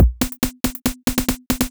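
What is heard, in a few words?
DRUMLOOP ELECTRO 140; Techno2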